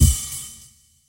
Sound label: wild landing; medallion; Fantasma